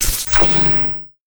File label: Gun Smith Start Forge